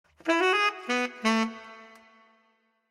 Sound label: tenorsax